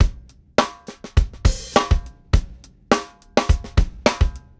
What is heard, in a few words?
104 Pop Funky Dr-Groove; Pop Rock; Moderate Tempo; Pro Tools Essential Loops; Digidesign; Drums